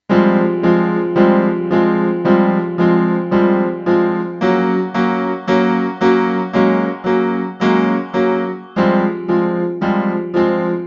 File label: Piano